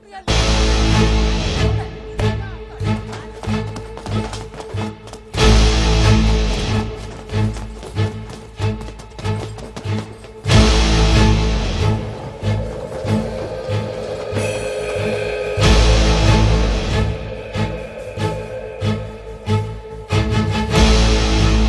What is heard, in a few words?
BGM Tone; Vedhas Enter Police Station